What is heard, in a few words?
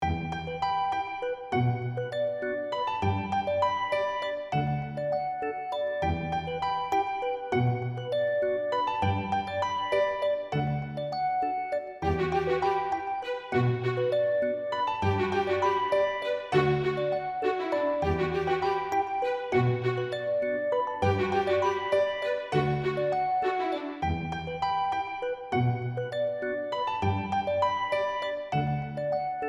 jingle